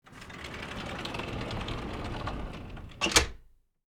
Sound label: Folding door closing